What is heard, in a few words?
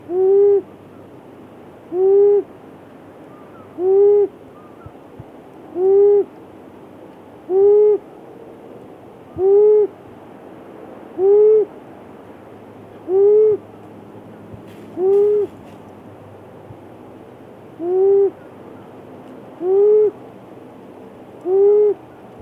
Long eared Owl Asio otus